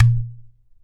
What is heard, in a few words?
IPU GOURD, HAWAII PERC; AKAI S6000 CD-ROM; WorldSounds